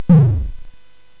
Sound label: SFX; Narco Police; hvsc